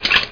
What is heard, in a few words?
Snup; Twenty-five Beeps #3a